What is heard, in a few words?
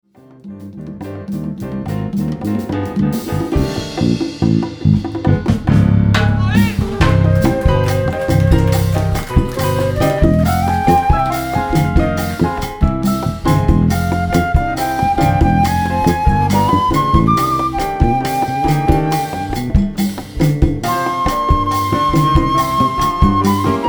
Latin Jazz